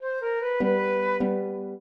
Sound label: trio, flute harp; Mozart